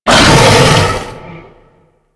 Infested Bone Blade Vocal Mortar; Warframe

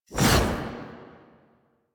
Blade And Whip Pull Back; Weapons; Melee; Warframe; Dagath

